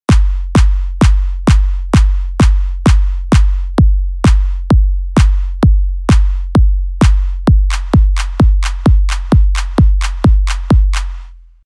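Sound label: 4つ打ち bass drum clap sample; EDM; バスドラと同時に鳴らす; 2と4の時に鳴らす; バスドラの裏で鳴らす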